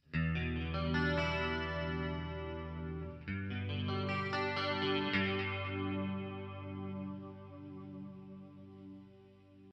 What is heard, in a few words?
clean